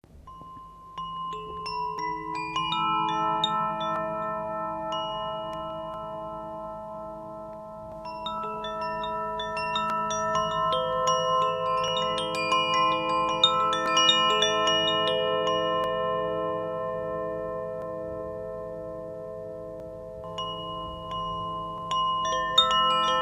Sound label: Koshi chime Aria